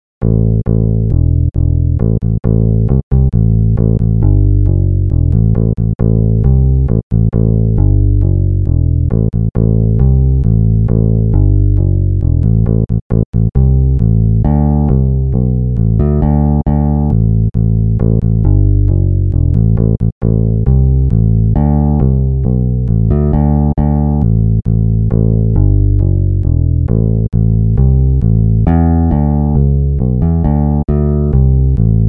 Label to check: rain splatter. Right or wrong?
Wrong